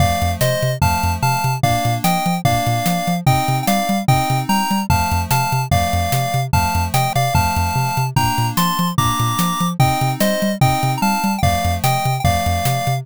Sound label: bgm street